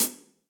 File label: Hihat Closed BigPunchy; Drum Hits; Ableton; Factory Packs; Session Drums Club; MPE Hits